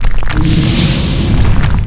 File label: Mob die; Monster; 패왕릉; 보병